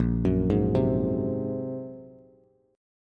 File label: Bb 7th Suspended 2nd Sharp 5th Bb, Bass Standard; Bb7sus2#5; arpeggiated; 6,9,6,5; JGuitar